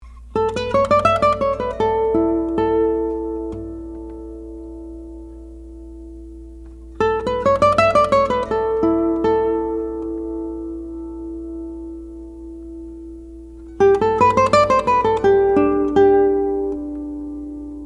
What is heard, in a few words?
resonance; Resonance Test (Stopped scales / chords showing sympathetic / resonance); Blue Woodpecker ribbon mic; Presonus ADL 600 mic preamp; Sony PCM D1 flash recorder